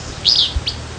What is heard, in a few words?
wheeoowhit; Traill